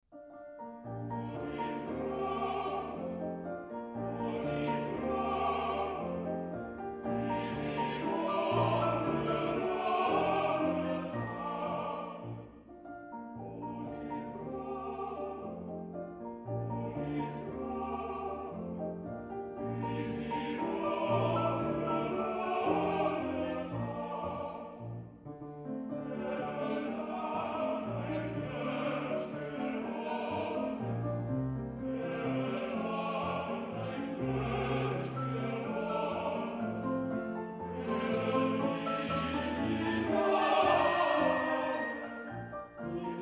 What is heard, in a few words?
Waltz; brahms; liebeslieder